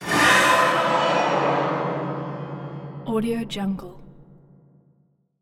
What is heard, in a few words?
horror string strike; asylum